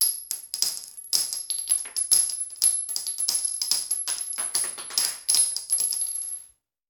Bullet Shell; Sound Ideas; Guns

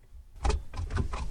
seatbelt unfasten; Feet; Apotheorasis

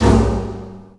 Menu Magic

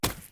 boots mud; Apotheorasis

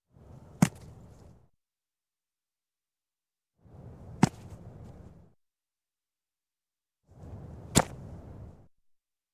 Football Catch Ball; Sound Ideas 2000 Series

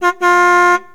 69 Mustang Horn; SFX Kit; Automobiles

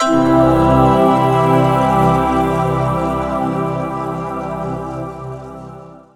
Music Logo Sting; Menu FX

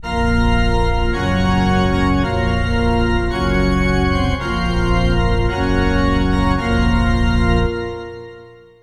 Music Funeral March; SFX Kit; Foley - Misc

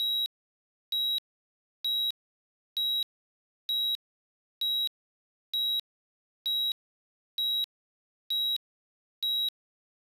Heart Monitor; Medical; Blastwave

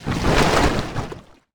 Lava Emerge; Destruction

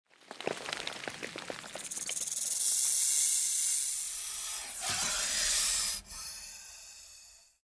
Deep Crack; Blind Quest - The Frost Demon

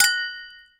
Hit Glass Jar Clink; SFX Kit; Foley - Misc

